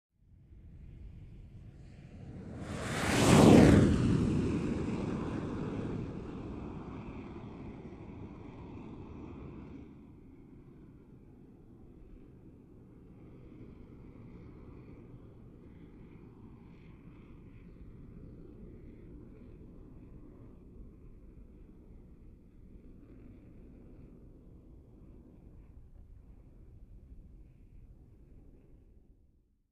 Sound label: Commercial Plane Flying Over Swoosh